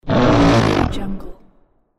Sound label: game character creature voice demon scream